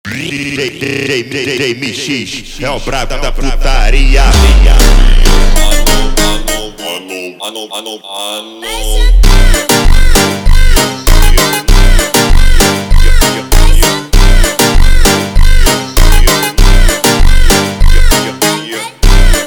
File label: Montagem slowed